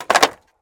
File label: Skateboard Ollie; Blastwave FX